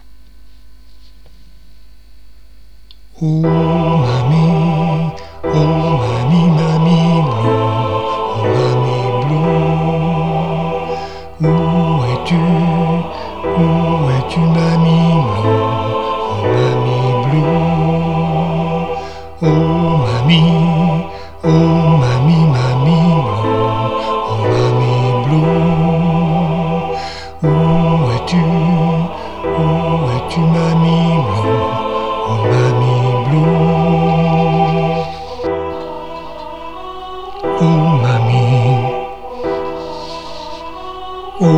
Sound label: basses